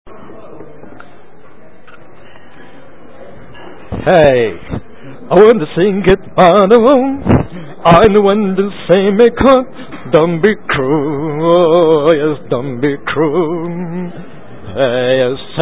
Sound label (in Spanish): canto